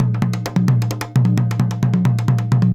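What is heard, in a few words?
junjunba groove; Best Service; World Colours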